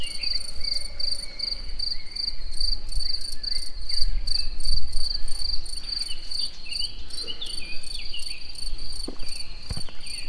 madarak is, tücsök; allathangok; termeszetben; egerturistahaz

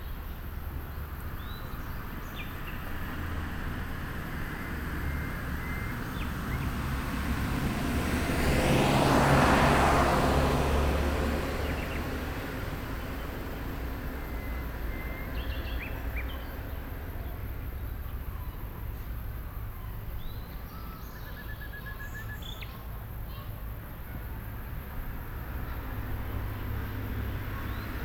egy fel, ket tole, egy fel, szallastol keletre, kanyar; Thassos

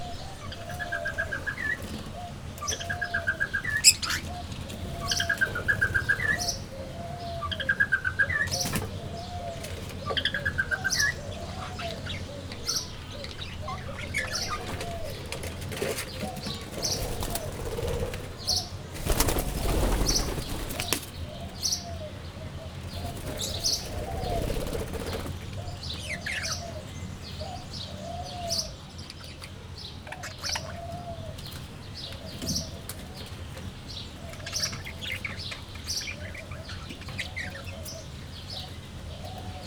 hullámos papagáj nimfapapagáj repkednek; jaszberenyi zoo 2015

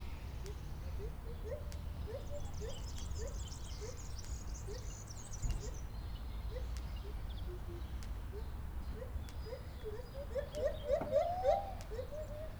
autó és villanypásztor, fehérkezű gibbon; debreceni zoo 2012; marantz puska

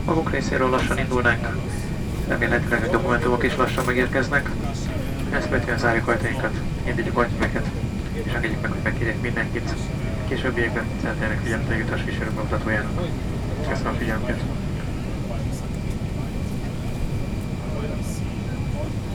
a magunk reszerol, airbus 320, repulo

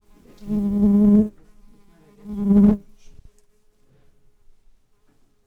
egészen közelről, rovarok; termeszetben; egerturistahaz

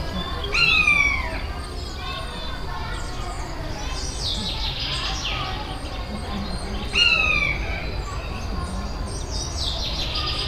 vijjogó madár, egerészölyv; allathangok; veszpremizoo 2013

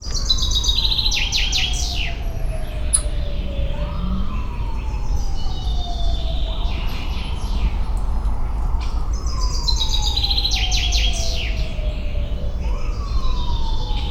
magas csipogó hang néhányszor, aranyfejű oroszlánmajom; szegedi zoo 2011

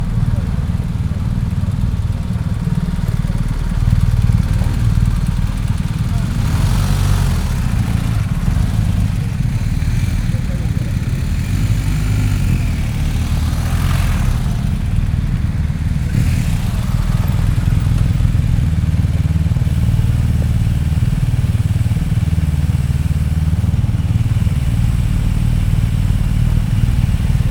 romváros honda motorosok

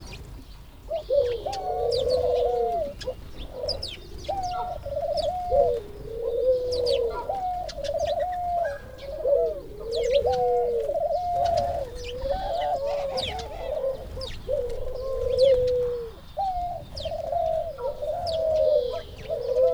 sokadmagukban, kacagó gerle; debreceni zoo 2012; marantz puska

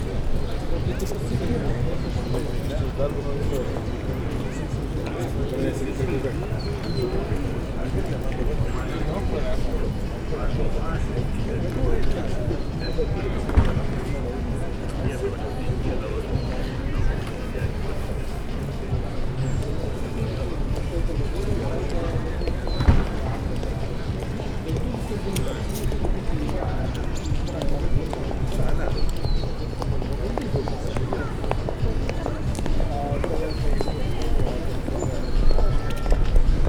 kisgyerek nevető felnőttek sétáló utca

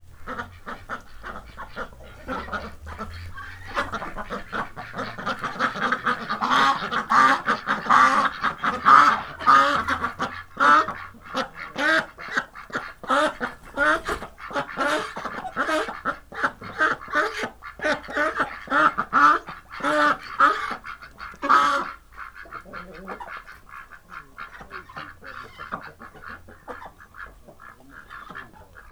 egyenletes, hazikacsa; állathangok; paraszt udvar

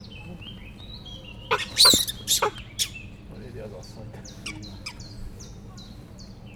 nyúlfarknyi kozjáték, dzseladapávián; allathangok; veszpremizoo 2013; akg

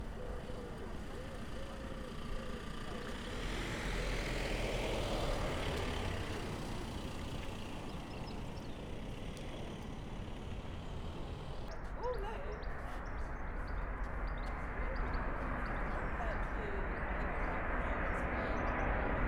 landoló gép elhaladó autó

